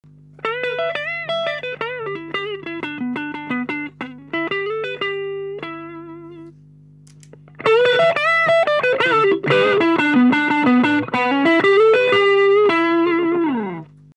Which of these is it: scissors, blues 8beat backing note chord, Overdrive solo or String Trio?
Overdrive solo